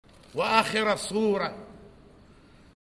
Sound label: loop